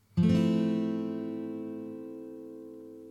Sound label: Fmaj7, guitar